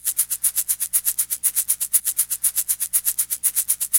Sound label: Shaker; Continental Drift Aftershocks